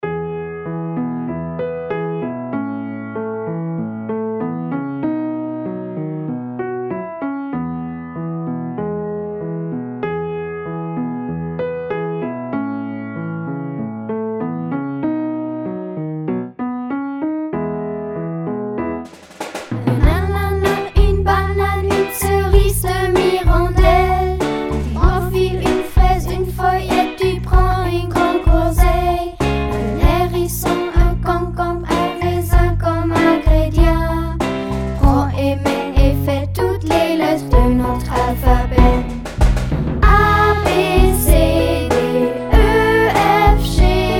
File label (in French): toutes les lettres vocals